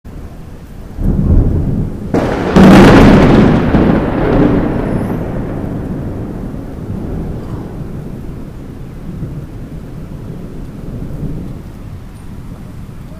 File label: tropical lightning